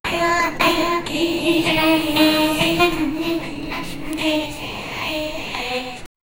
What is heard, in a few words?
a very scary and very, doll